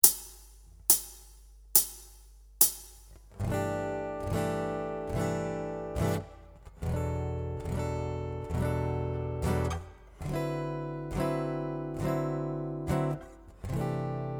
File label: Chord Voicings